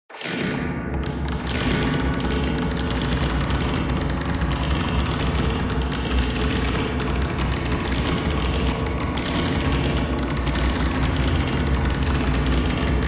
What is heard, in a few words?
bagpipe thread noise; reverb